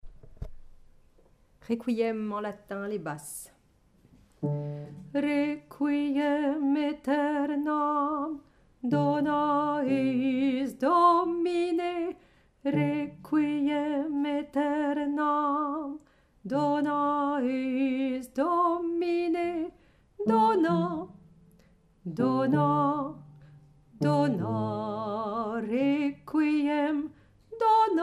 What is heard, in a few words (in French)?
Basse; ouolof; So Vocal